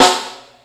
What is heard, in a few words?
Jungle Snare, Snare One-Shots; KJ Sawka; Drum n Bass & Beyond; Drum Tool Box